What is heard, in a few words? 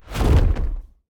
wing big; smite; god cthulhu